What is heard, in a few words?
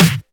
Claps & Snares